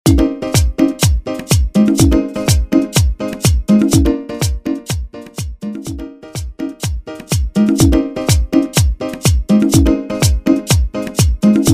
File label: Transition Mambo To Bachata 124 BPM